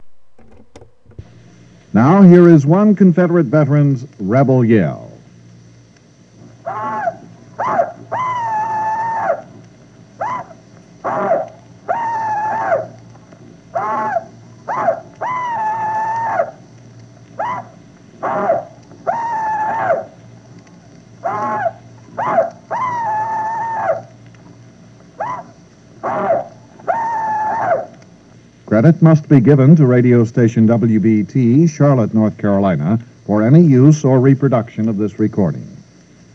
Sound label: Rebel Yell